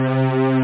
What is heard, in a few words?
string; OctaMED SoundStudio V1; strings